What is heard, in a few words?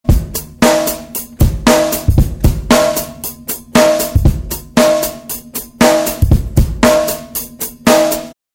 drumloop